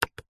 car hazard warning button press interior nissan patrol; zapsplat